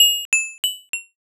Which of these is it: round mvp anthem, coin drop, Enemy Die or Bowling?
coin drop